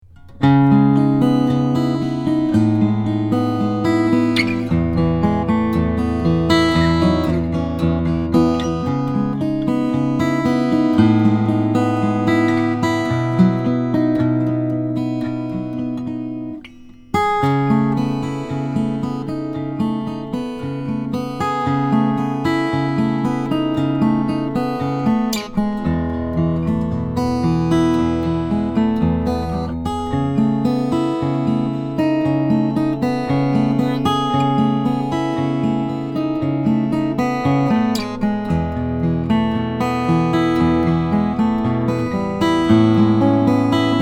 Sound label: rasmussen s cutaway; the tree